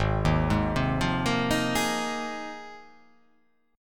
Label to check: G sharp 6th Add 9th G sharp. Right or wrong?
Right